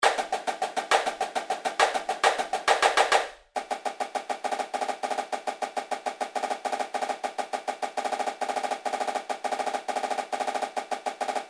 Triplet Dogs 136 bpm Snare

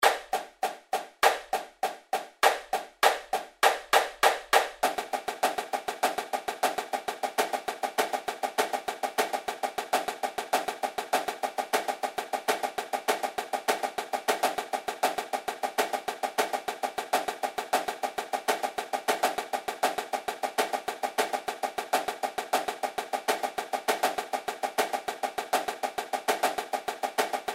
16th Note Grid 100 bpm Pattern Track

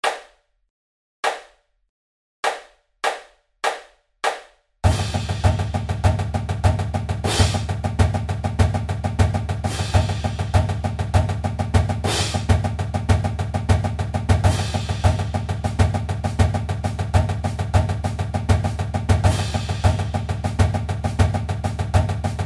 16th Note Grid 100 bpm

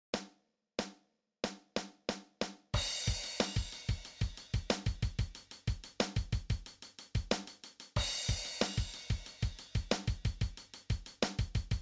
Drum Set

